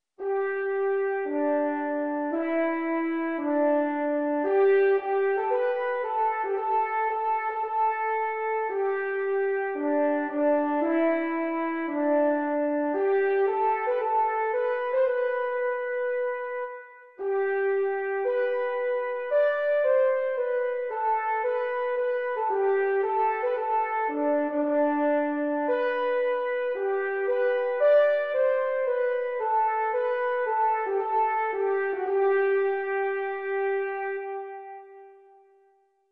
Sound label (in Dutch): zonnelied; kinderliederen